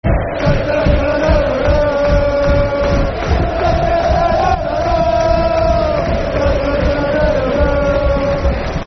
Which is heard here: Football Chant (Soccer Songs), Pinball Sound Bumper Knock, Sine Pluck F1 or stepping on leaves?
Football Chant (Soccer Songs)